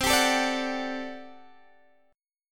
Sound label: G Major 7th Suspended 2nd Suspended 4th C